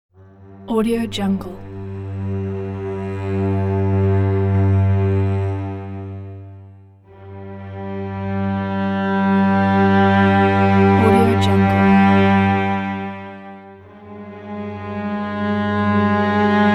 dark folk strings

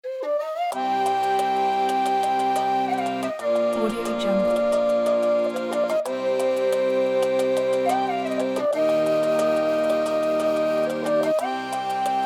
middle folk ident

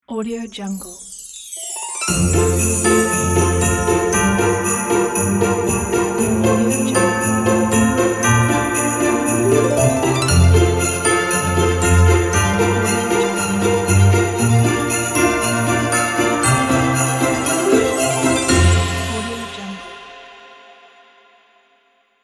christmas logo

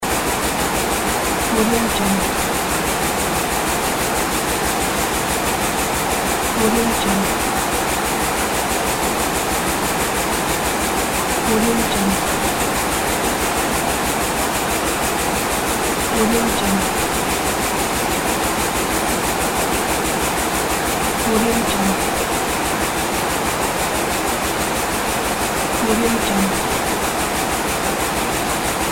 industrial machinery background

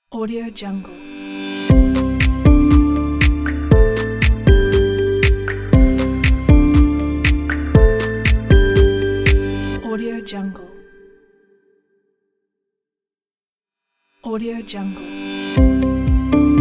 positive modern logo sound

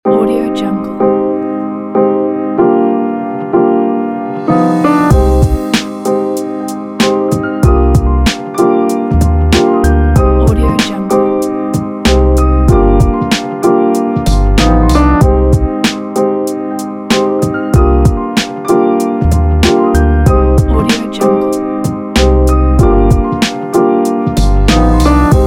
lofi chill

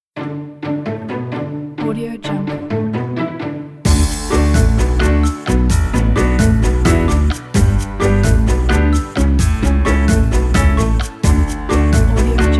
funny cartoon

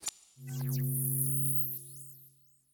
subtle appear; soundmorph

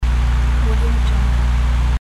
car

